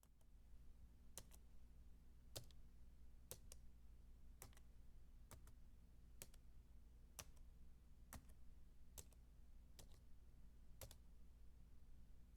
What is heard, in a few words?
computer typing slow